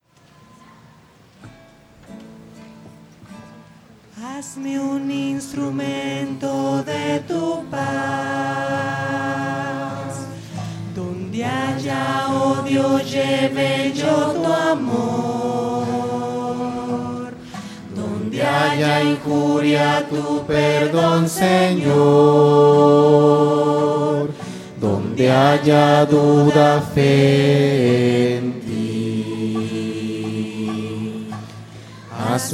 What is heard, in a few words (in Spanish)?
hazme un instrumento de tu paz voces; misa